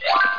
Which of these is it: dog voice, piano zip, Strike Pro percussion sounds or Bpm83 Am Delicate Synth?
piano zip